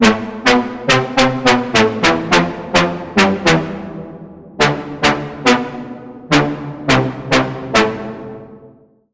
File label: Lead; Oyabun; Routed Sound; Vital Expansion - Noble Gangster Vol.1